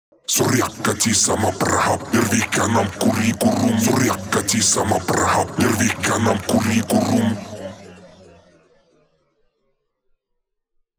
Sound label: 138 Shamanic Voice (Wet), Psytrance; Shamanic Voices